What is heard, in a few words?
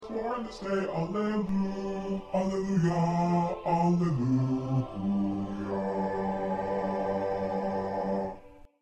2nd Bass